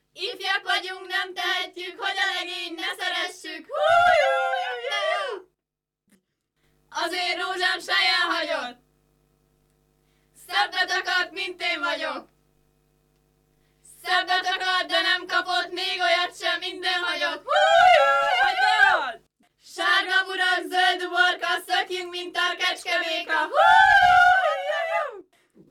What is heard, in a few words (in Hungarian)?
nepdal; csujogatók